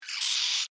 Insect Voice Cry, Animal, Insect, Voice, Cry, Emotion, Vocalization, Bug; Pain